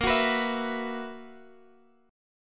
B Major 7th Suspended 4th Bb

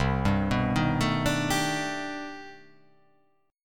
C Minor Major 13th, chord

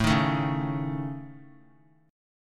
A Major Flat 5th A, chord; AMb5; strummed; JGuitar

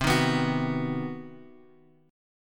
C Minor Major 7th Flat 5th chord, Bass Standard; strummed; JGuitar

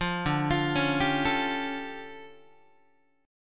D Minor Major 11th, down, Guitar; DmM11 arpeggiated; JGuitar

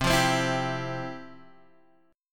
F Major 7th Suspended 2nd, chord

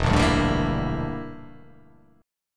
E Minor 7th Flat 5th E

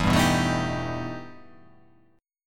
E Minor Major 11th E

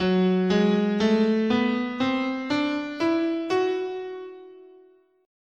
F sharp Locrian sharp 2, scale

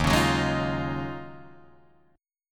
Eb Minor 11th Eb down Guitar Drop D

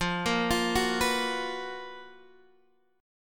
Gb Major 11th

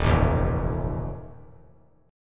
Eb Suspended 2nd Flat 5th Eb 3C 3F 3A 3D; Ebsus2b5 strummed; JGuitar

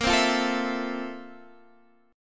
F Major 7th Bb; Fmaj7/Bb; strummed

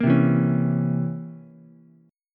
C 7th Flat 9th C, Ukulele; C7b9 strummed; JGuitar

